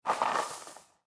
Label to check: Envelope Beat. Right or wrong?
Wrong